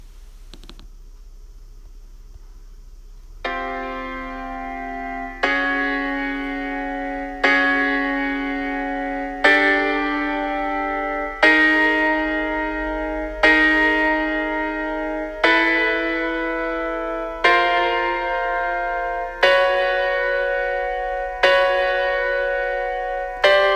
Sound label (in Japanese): サスペンテッドフォースコード音源